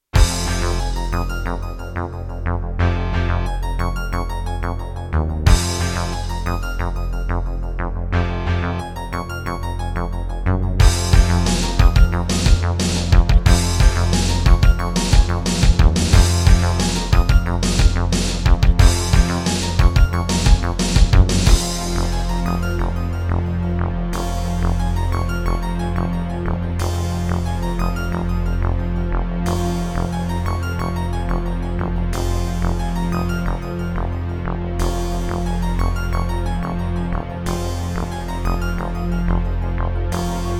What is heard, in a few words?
MidiPlus MiniEngine USB; sigil doom episode 5